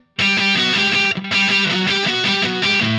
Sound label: Happy Pub Guitar